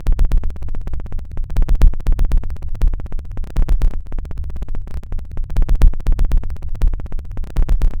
Click Clacked

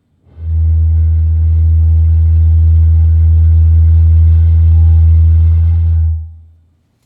Big bottle

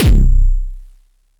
Hardstyle Kick

